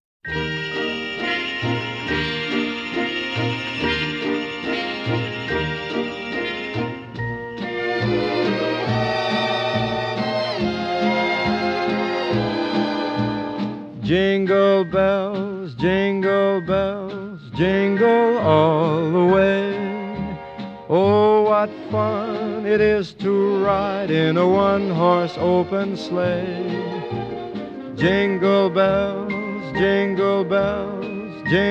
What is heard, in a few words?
Jingle Bells